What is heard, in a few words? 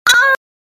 Loud; Play this in class At full volume; Funny